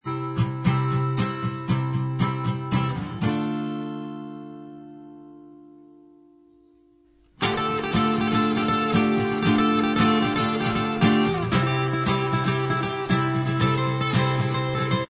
acoustic, Inst

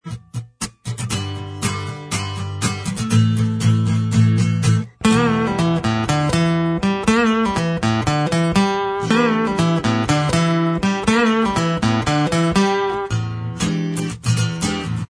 acoustic Inst

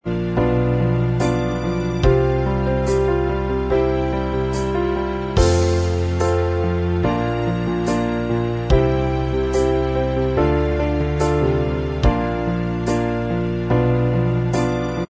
Inst Track

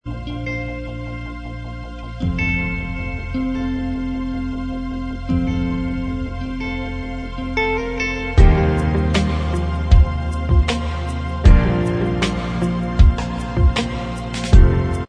Inst

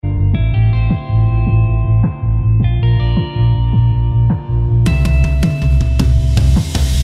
Inst

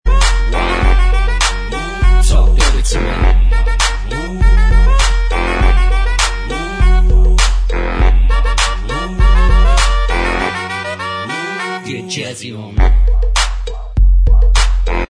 Bvox Track

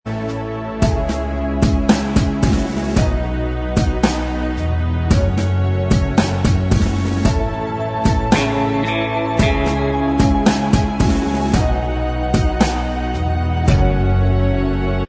Inst

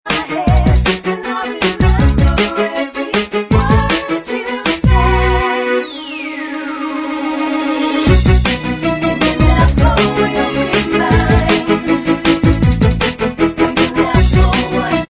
Bvox Track

